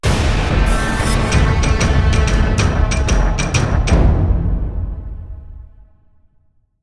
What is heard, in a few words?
Stinger Afghan; Warface